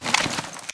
spectre curb stomp grunt; Titanfall 2

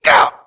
grunt death neck; Titanfall 2

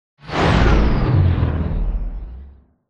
deploy from mega; Titanfall 2

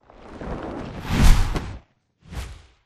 flyer perched takeoff attacked med dist; boneyard; Titanfall 2

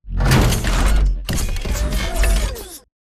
embark casual behind; Titanfall 2

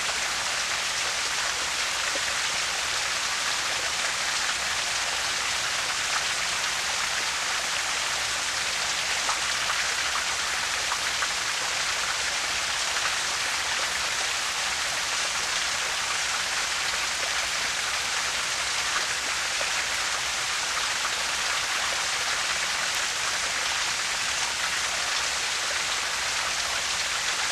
Small Water Fall Bottom Dist; Homestead; Titanfall 2

